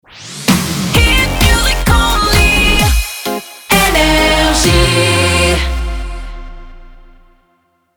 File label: Jingle Shotgun; NRJ France; 130bpm